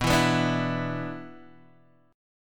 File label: B-Minor Double Flat 5th, Guitar Open G